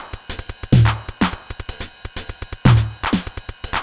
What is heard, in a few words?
drum scatter top 125, Drum Loops, Classic Chicago House